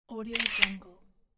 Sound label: photo camera shutter click